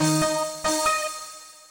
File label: startup